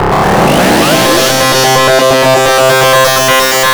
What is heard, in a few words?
GRUNGE